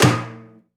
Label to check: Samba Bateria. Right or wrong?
Right